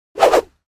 Rope swish fast whipping

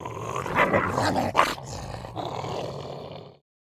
Angry wolf attack

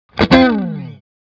Electric guitar slide

